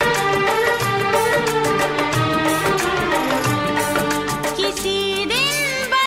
Music Old Song Ringtone

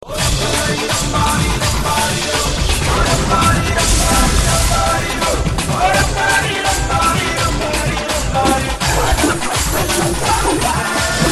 Malayalam Bgm Ringtone

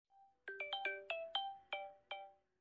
Samsung S8 Ringtone; ringtones

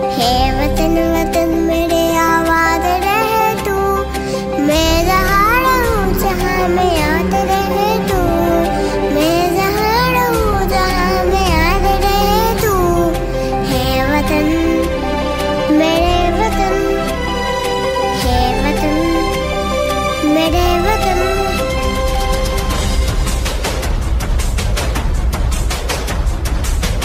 Female Desh Bhakti Ringtone

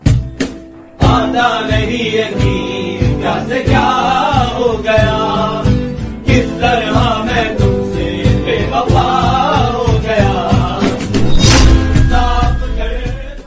Sad Hindi Movie Song Ringtone

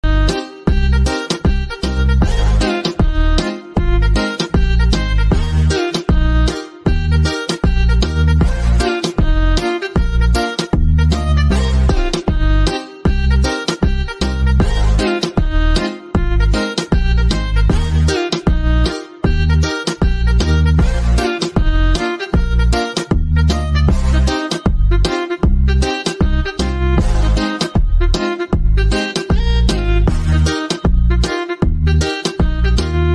Ringtone; ringtones

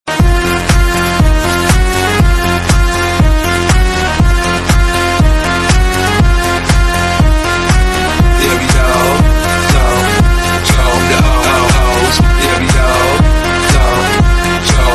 Remix Music Ringtone; ringtones

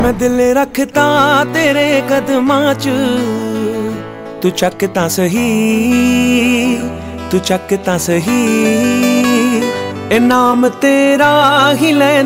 Punjabi Valentine Day Ringtone

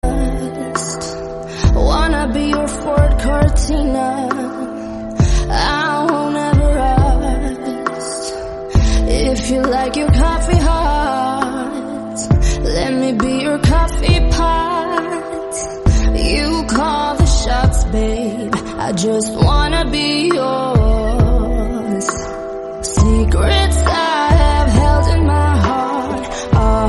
Female Song Ringtone

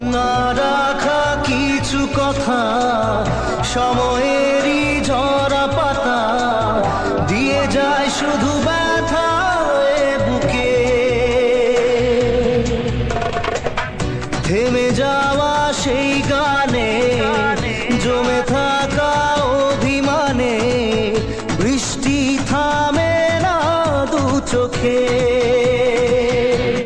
Bengali Song Ringtone

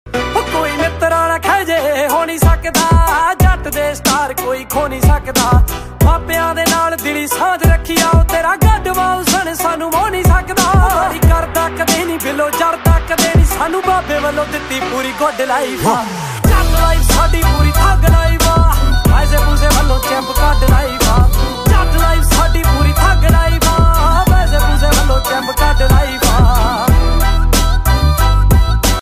New Punjabi Ringtone; ringtones

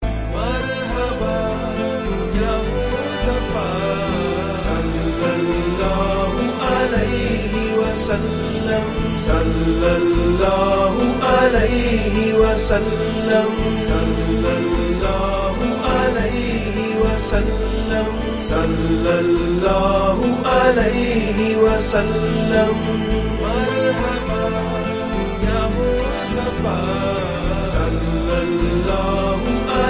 Naat Ringtone

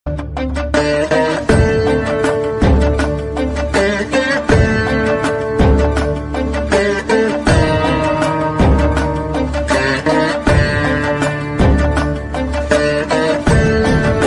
BGM Ringtone

